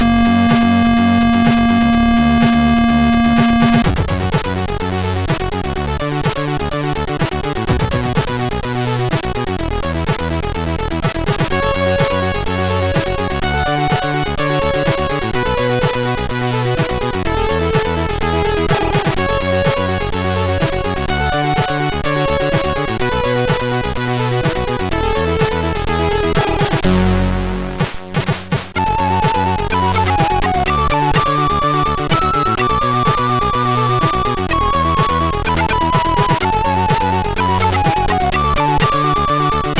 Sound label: Instrument, sid MOS6581R3